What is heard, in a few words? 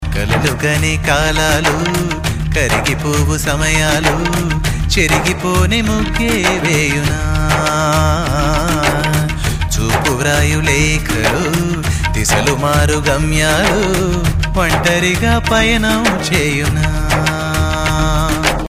Male Ringtone